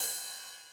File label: Ride Mid Decay, 909